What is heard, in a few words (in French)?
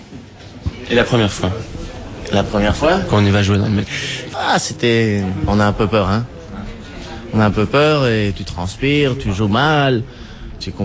Public du metro guitariste argentin